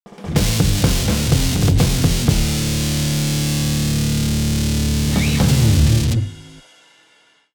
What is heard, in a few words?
round end; A Sound Plan